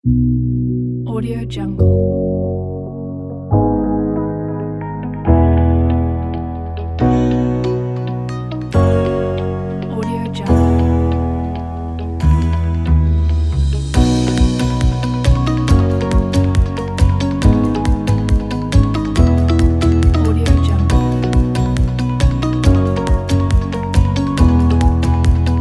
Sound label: corporate travel music